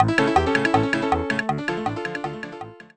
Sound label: fade out music; High speed piano and wood block tune quickly fading out to silence.